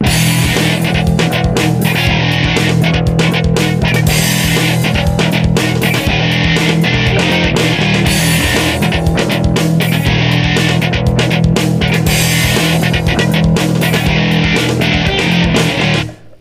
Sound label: Funk rock style short riff